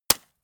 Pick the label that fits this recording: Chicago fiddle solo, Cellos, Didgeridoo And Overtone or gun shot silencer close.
gun shot silencer close